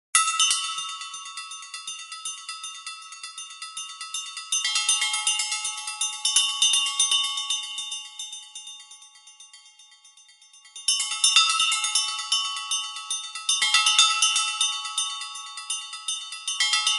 Perezvony kolokolchikov na Bali; Колокольчики

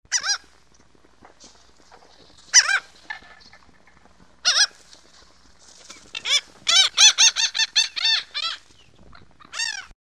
chajka podletela k ljudjam na parapet

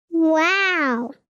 malchik ili devochka; Вау звук

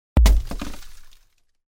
mashina sbila cheloveka

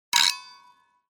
zadevanie v neskolkih mestah

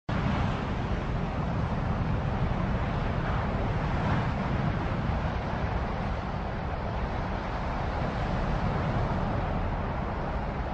sploshnoj zvuk mashin v gorode

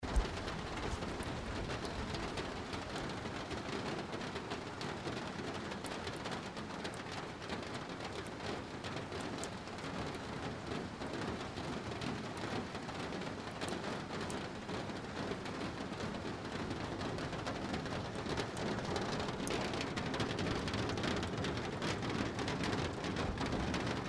zvuk dozhdja po steklu

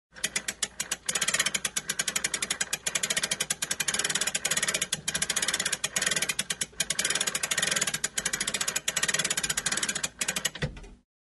Zavodiat NAPOLNYE CHASY

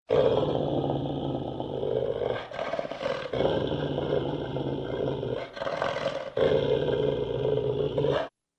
predurezhdaet rychit

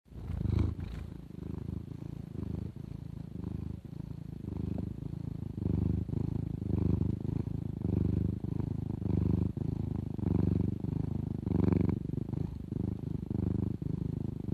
bystroe ravnomernoe urchanie

